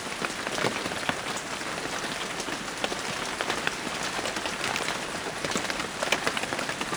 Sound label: rain, ambience; Soldier Of Fortune II Double Helix; XBOX